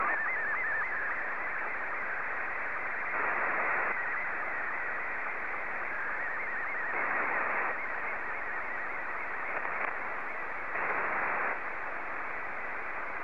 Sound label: sstv